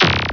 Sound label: delete gadget sound; Widget Workshop; A Mad Scientist's Laboratory (1996); Maxis